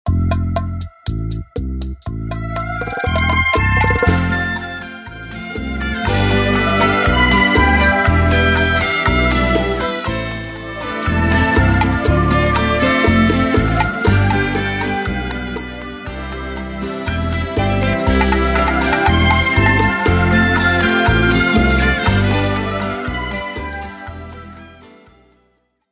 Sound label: Intro 120 BPM